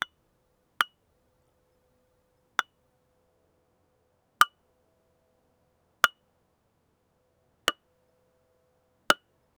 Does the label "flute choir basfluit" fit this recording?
No